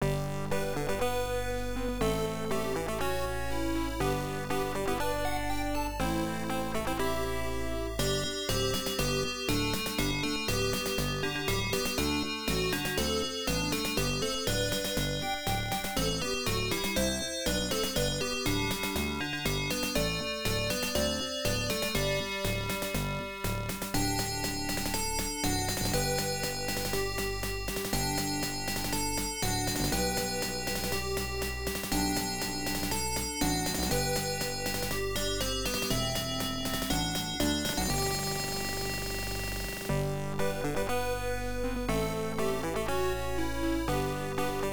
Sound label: Victory; Toushin Toshi II; pc-98